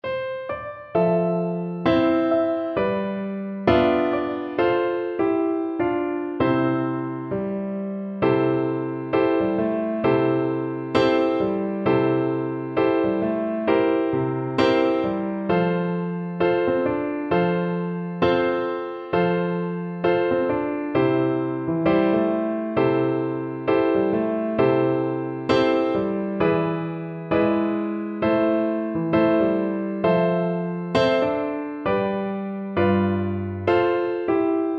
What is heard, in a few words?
this little light; Percussion